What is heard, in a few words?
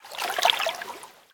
lake; Level2 Ice Fields